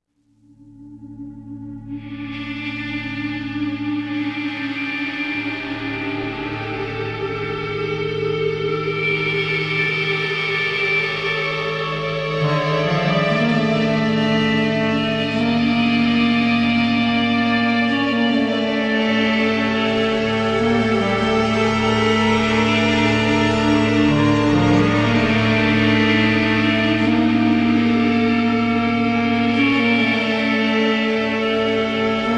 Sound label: Unearthed Catacombs Ambient Music; Spider-Man Friend or Foe